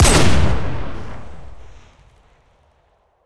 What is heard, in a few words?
colt fire; weapons; kuilu